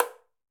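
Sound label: Tamborim B Mute; Latin Percussion; Drums; Drum Hits; Tambourin; Ableton; Factory Packs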